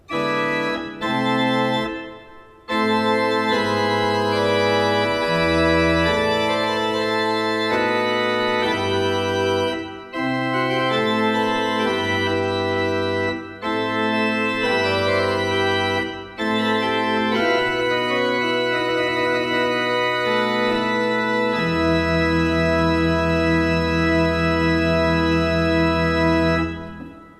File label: Kleuker, pipe organ